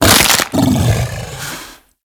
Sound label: Feral Vocal Finisher Neck Snap; Enemies; Kubrow; Grineer; Warframe